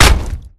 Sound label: slug hit